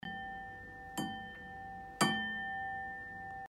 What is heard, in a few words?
Harmony Flower G5 Blue